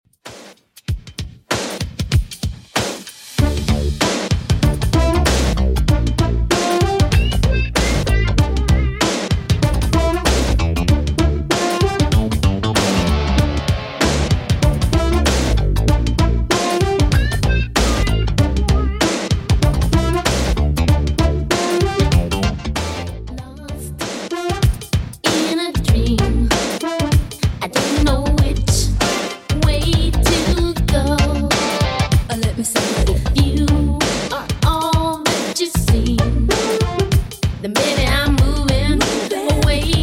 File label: 80s Redrum 96bpm